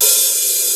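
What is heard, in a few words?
Open hi-hat, Roland JD-990; Drum Samples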